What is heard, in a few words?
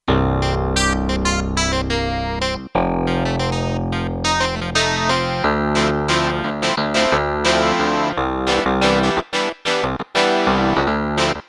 Wiry Comp, Kurzweil K2500X